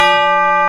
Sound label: Tubalar Bell, Korg M1; Drum Samples